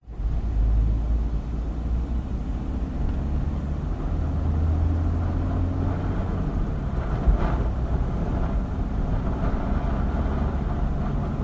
vehicles car nissan patrol 1998 4x4 diesel drive 60kph passenger window open